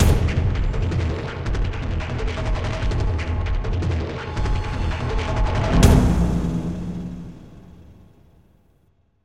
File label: combat outro